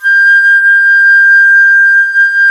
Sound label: FLT XFLT, Flutes; Club-50 - Foundations Roland